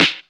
juicy snare sample g key, snare single hit tuned to the G note; snare drum samples